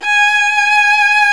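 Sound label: G#4 VIB. violin; Northstar - New Gold VOL-2; ORCH.COMP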